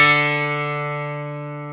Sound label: GT D2; AKAI S-Series CD-ROM Sound Library VOL-8